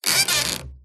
Stress Creak Squeak Groan; PMSFX

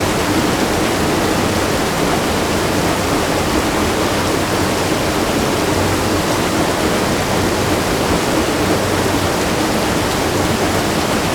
industrial watermill operating slight distance; tom chapman

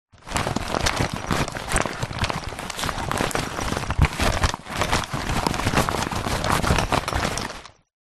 foley luggage case small childs movements

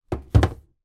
industrial drill place down on wood table

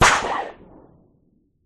Metal Large Sheet Sledgehammer Hit; Sound Spark

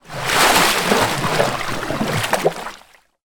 animals shark splash surface water feeding

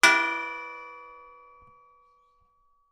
industrial gas cannister medium hit ping

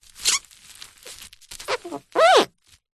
plastic cellophane sheet rub squeak rustle; foley

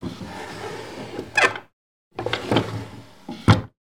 Wooden Drawers, Open, Close; lilesound

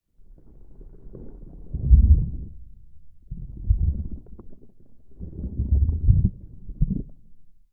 human bodily functions stomach rumble close up

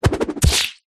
warfare throw knife spin hit body squelch

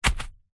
household mobile phone drop grass; zapsplat

